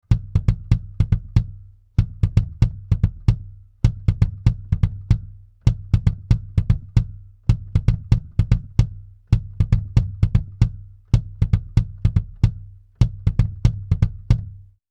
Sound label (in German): Comp Plus BD Parallel 20 zu 1; Fredenstein Artistic